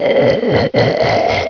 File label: zombie pain; hunger; hungerzombie